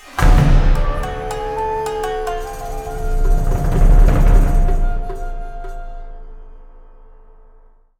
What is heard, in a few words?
Fanfares, intro; mk9 tym